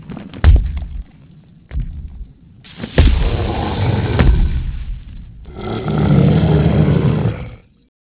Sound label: mist walking monster